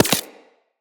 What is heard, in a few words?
Laser Impact Generic